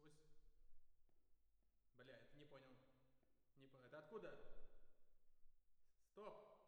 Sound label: Perc